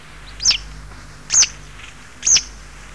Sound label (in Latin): Motacilla citreola